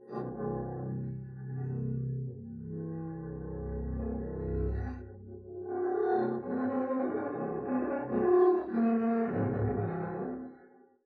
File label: arco noise moan long low to high, double bass; dsmolken; noises